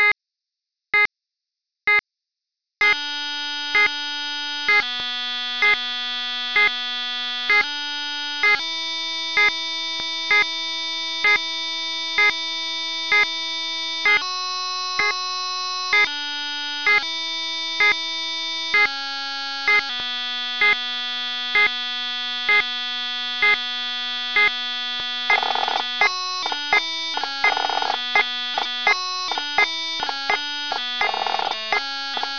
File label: Pipe Snare, 84bpm; The Gael